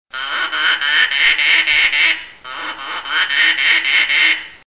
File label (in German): ente